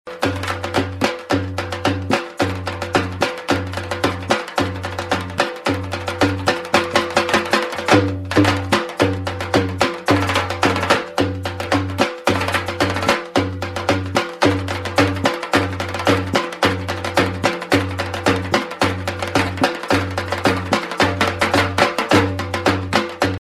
dhol disco ringtone

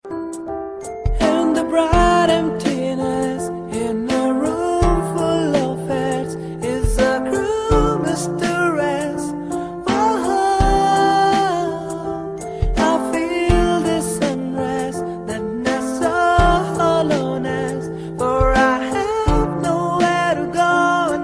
ringtone

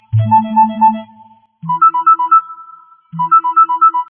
lg stylo 5 sms ringtone

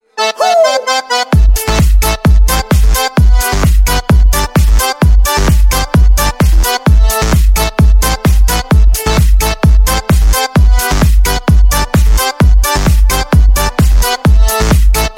electro ringtone

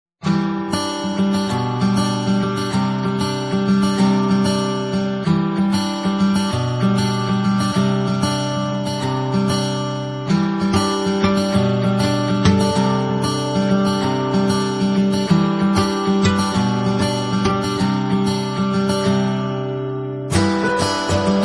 guitar tune ringtone

